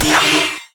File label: NPC Hit